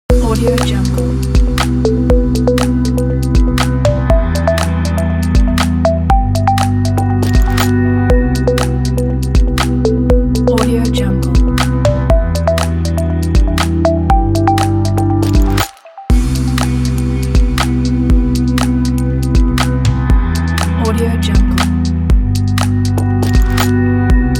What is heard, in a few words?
abstract future ambient loop